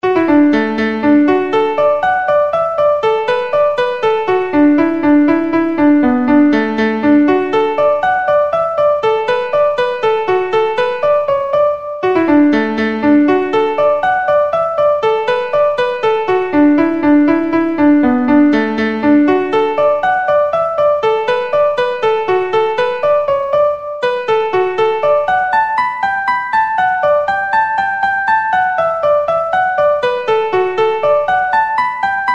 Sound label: Gold Ring; Piano